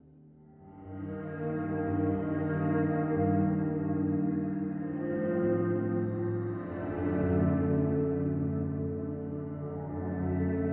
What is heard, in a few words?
Eventide Blackhole Reverse Full Wet